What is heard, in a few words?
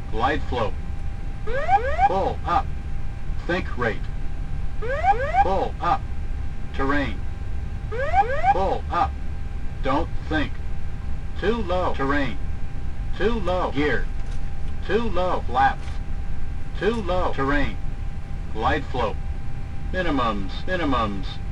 gpws test, Aircraft A320 - 321